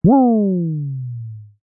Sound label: Retro Scifi Hit, One hit of a retro machine or motor start and stoping rotating very; One hit of a retro machine or motor start and stoping rotating very fast, or a shot of a strange gun; Lynne Music